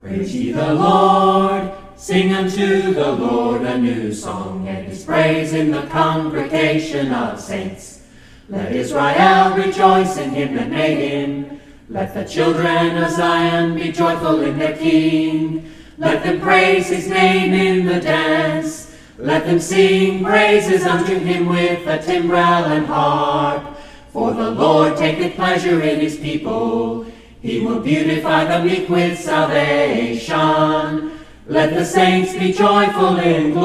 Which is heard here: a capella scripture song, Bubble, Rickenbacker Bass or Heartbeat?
a capella scripture song